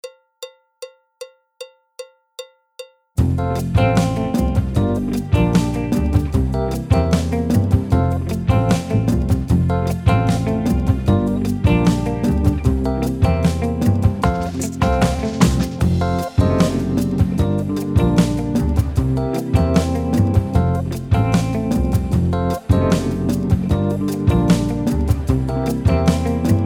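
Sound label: Calypso backing track, Jazz; alto, tenor, trombone, piano; Jazz Syllabus Level 2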